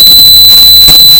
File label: bip; svencoop